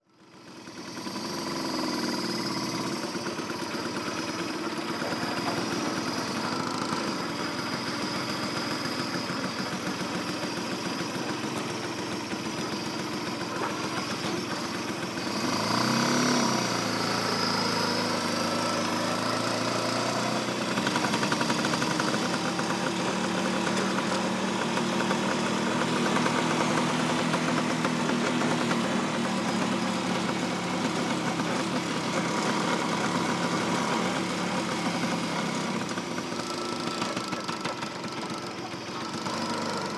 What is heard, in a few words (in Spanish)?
MOTO VESPA micro en la moto andando